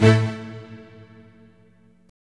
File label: horsey, String